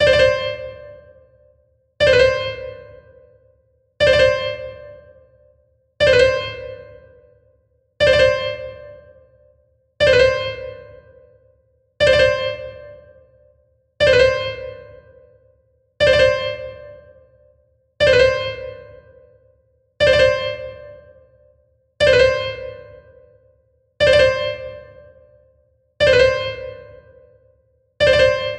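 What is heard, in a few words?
long mordent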